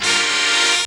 STAB; ZERO-G; Datafile

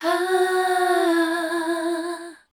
MELODIC #F, Voice

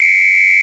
PERC; ZERO-G; Datafile

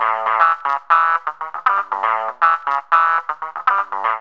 GTR LOP; E-Lab; X Static Goldmine 2; akai

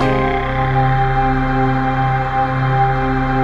ATMOPAD; Best Service; XX Large; Pads

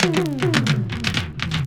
ROLLS; E-Lab; X Static Goldmine 2

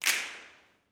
SNAPS, Gospel

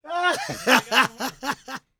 PREACHIN, laughs, jazz

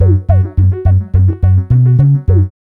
B LOOP

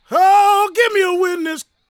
GOSP MALE, Vocal Planet Gospel

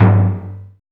TOM; E-Lab; X Static Goldmine 1

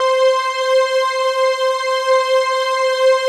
PAD, ATMO PADS; Best Service; XX Large

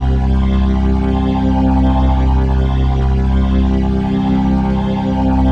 PHASE PAD, ATMO PADS; Best Service; XX Large

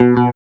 GTR PIK; E-Lab; X Static Goldmine 1

